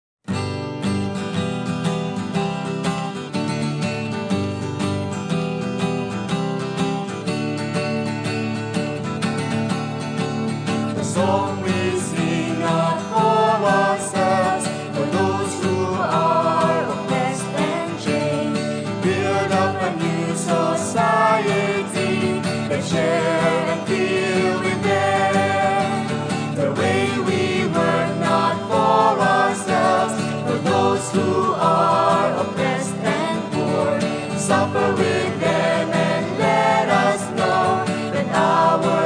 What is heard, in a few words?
guitar